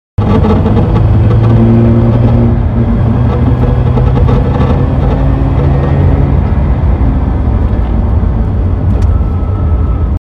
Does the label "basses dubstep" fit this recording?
No